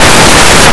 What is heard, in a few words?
book